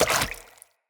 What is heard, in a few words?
Root Spider Mother Step Water, Spiders